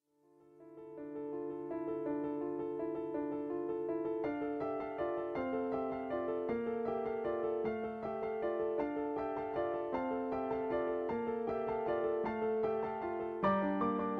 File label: Bell Carol